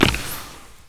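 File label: glass break impact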